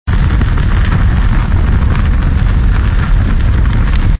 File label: Fire Loop